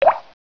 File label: water drop, ambience